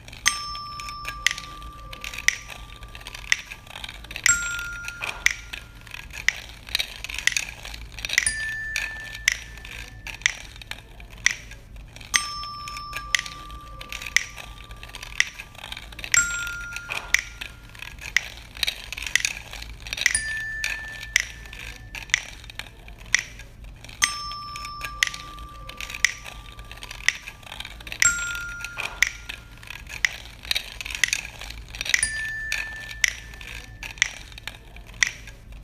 WALKING THROUGHT THE FOREST. LOW. MYSTERIOUS

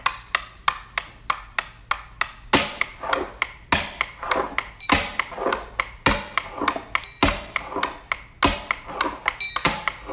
Mechanical looped 3 times

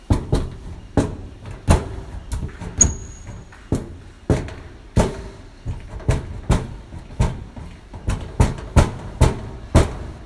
Clang

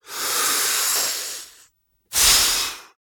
breath heavy

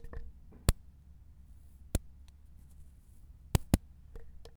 RUBBER BAND SMACKING

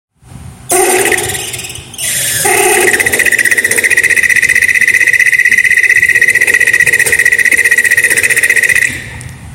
tap sound

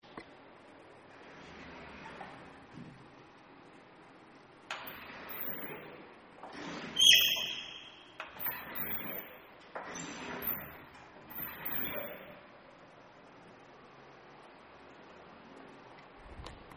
TOILET DOOR

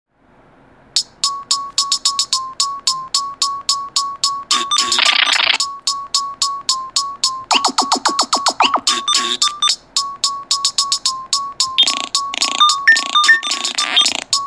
Static

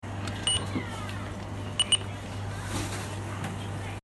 Pleasant sound

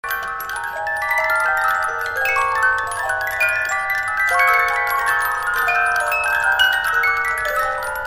distorted music

